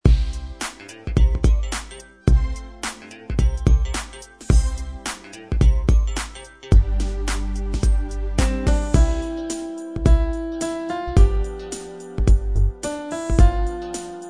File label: hiphop